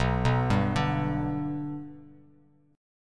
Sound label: B Minor Sharp 5th B Bass Standard, chord; arpeggiated; JGuitar